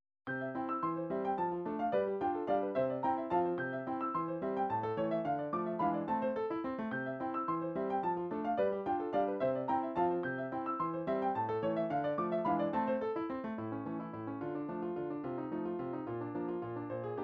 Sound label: waltz, midi